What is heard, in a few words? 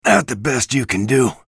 pain ability damage; bj blazkowicz; low health